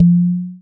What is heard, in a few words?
perc mod woodoo